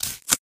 trade up apply stamp

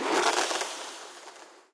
player enter jump paint

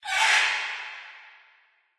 macaw; Source Engine; tf; lair

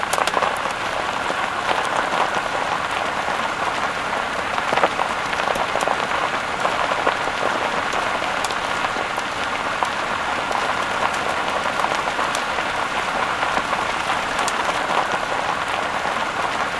van inside roll; Left 4 Dead 2; Source Engine

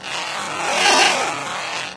zipline; npc; source engine; episodic; combine soldier

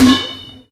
phys bouncy cube

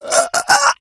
death, infected; npc; Left 4 Dead 2; Source Engine

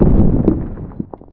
muffled boulder impact hard; npc; source engine; episodic; antlion

